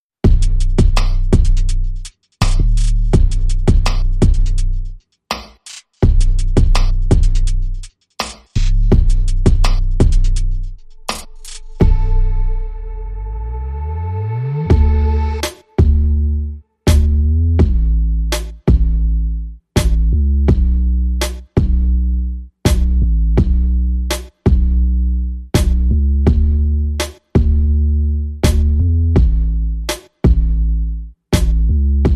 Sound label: Drums Bass and SFX Only